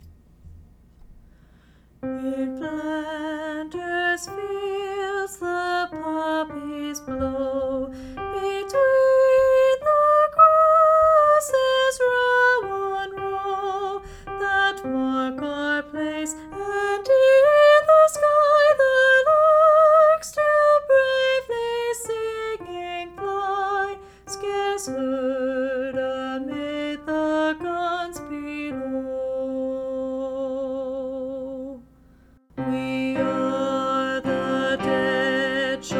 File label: Alto; In Flanders Fields